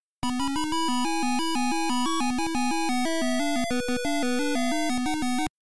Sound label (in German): Startmelodie; Dig Dug